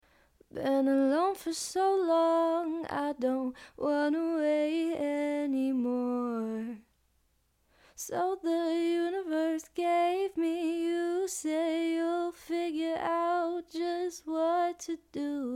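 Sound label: pop 114 E minor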